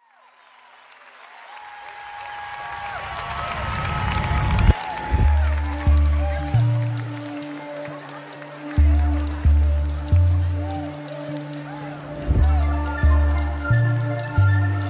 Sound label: Lion Instrumental Live